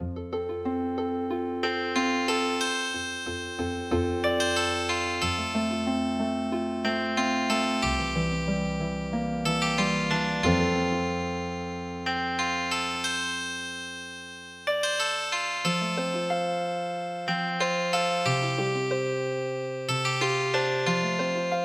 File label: Healing Harp, traditional